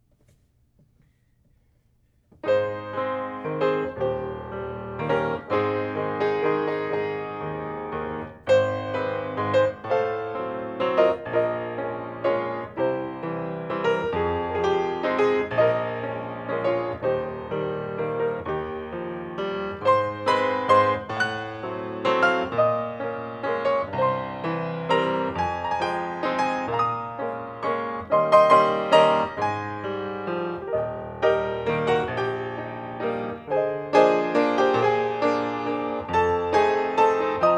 Ab waltz 42bpm

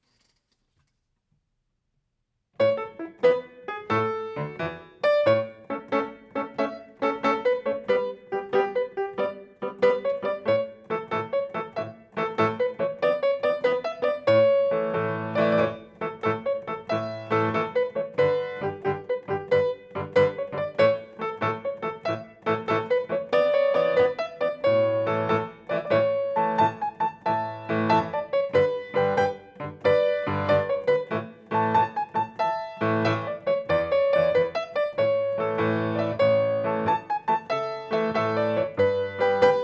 jig 90bpm; Felix the Wrestler

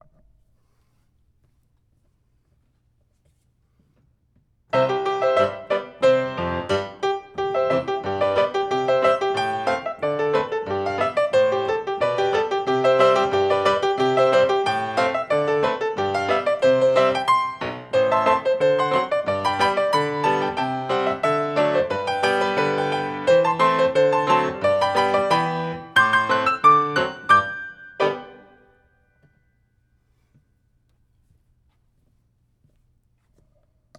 C polka faster; Smetana

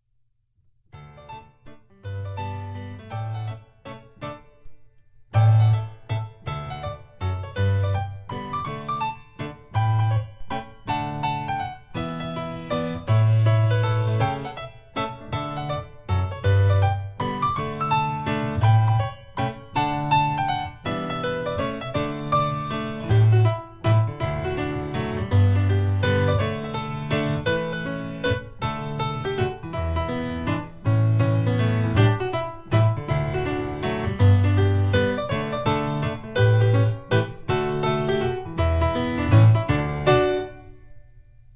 mazurka; Coppelia

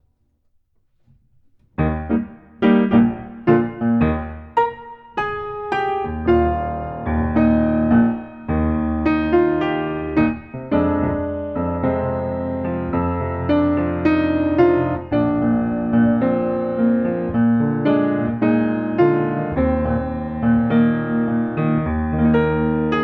solo tang, ballet class music

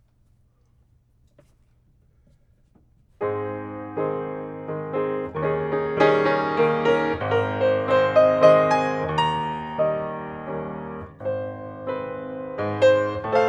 Ab waltz 29bpm adagio

